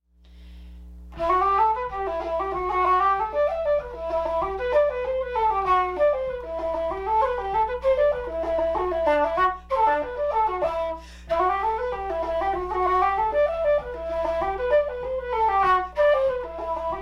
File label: SOLO flute